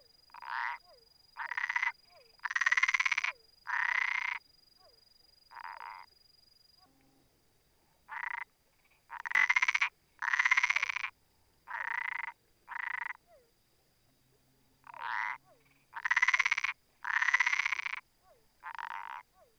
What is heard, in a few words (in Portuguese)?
Boana albopunctata canto anuncio